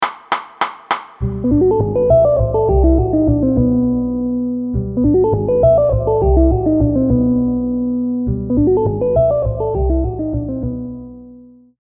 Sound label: jazzline